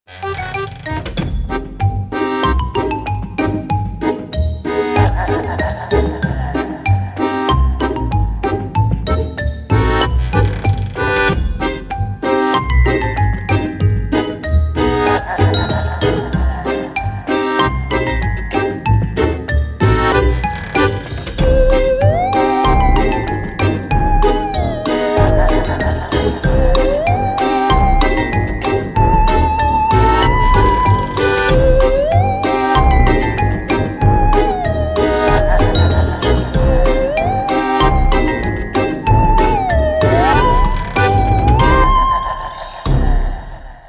funny happy comedy halloween intro for kids children